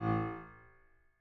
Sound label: harm A1, Grand Piano; bitKlavier Grand; Piano Bar